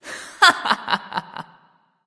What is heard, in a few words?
laugh; Celestial Isis; Smite